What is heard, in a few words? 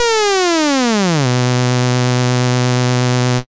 SID lead B; ChipShop Deluxe; ChipShop Hits